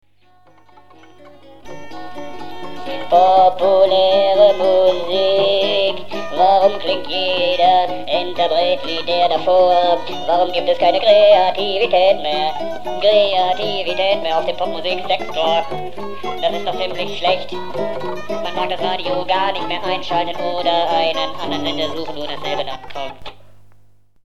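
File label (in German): populäre musik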